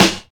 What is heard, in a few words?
focused snare drum F sharp key, Royality free snare drum tuned to the F# note